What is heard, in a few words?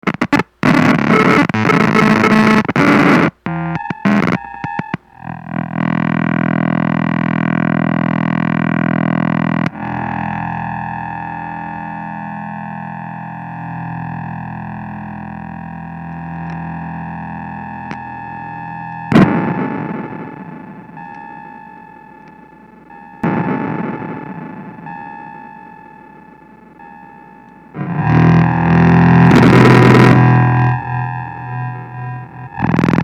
Casio Noise Nonsense; NoidSoup